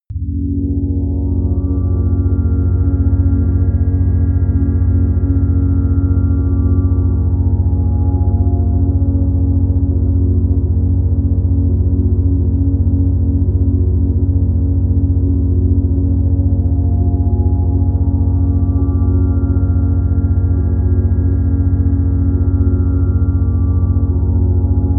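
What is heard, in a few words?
hallow air, pads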